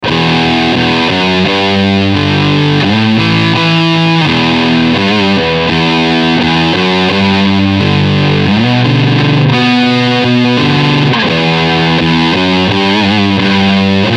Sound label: Fuzz POST Filter